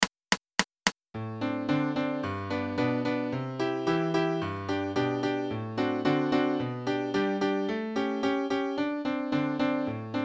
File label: Piano